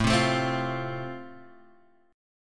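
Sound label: A Minor Sharp 5th, Guitar Open G, chord strum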